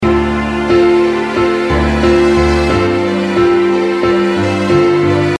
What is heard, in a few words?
strings